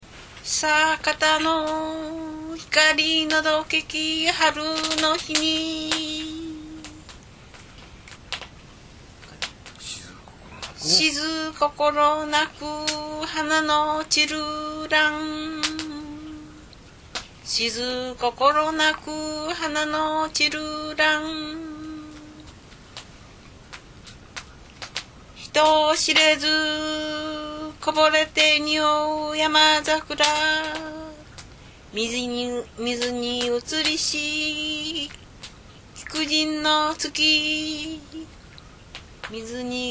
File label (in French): chant Japon